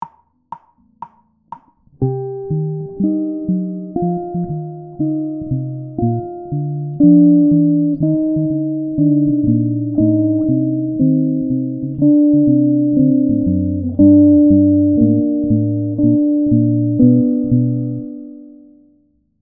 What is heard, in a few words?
chord